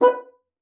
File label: bassoon, B4; Xsample VOL-6